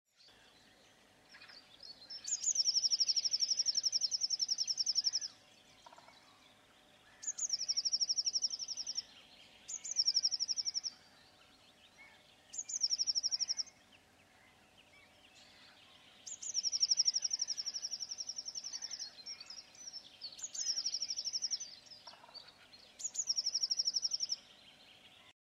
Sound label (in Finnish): sinitiainen